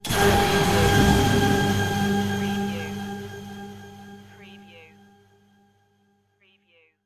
energy shield activation

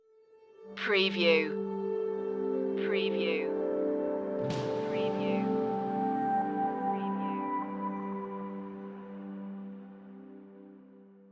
minimalist stinger

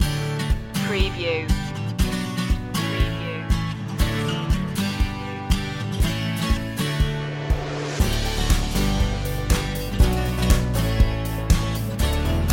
summer acoustic guitar loop

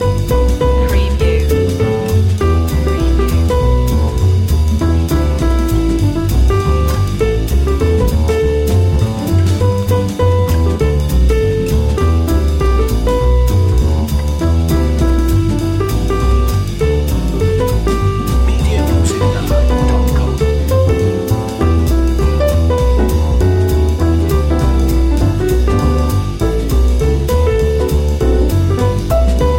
jolly old st nicholas jazz long loop

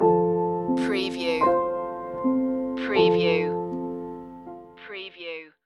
summer afternoon stinger

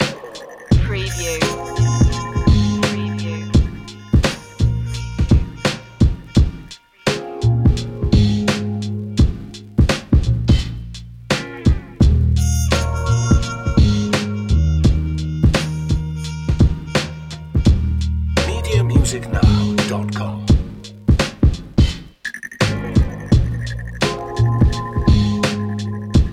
stoner beat loop

